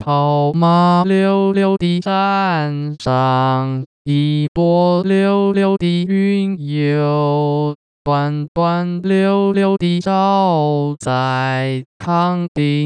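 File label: synthetic singing voice, 男聲, original; 使用頻譜包絡內插之國語歌聲合成 (Mandarin Singing Voice Synthesis Using Spectral Envelope Interpolation)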